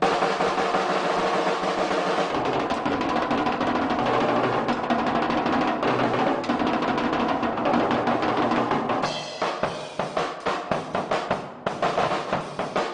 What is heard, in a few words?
beatdown